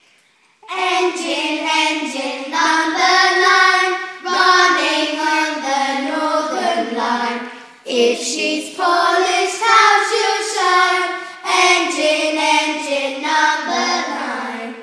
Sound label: engine engine